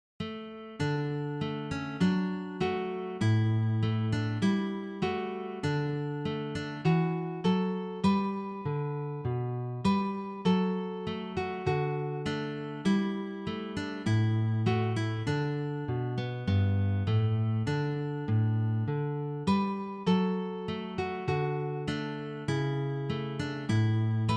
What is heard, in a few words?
auld lang syne, 2 Gitarren; traditional